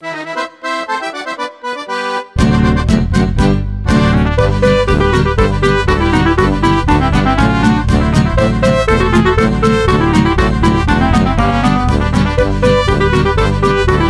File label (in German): Alpine Volksmusik Krampustanz